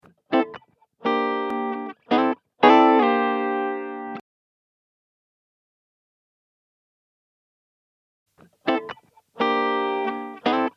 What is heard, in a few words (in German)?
Gitarre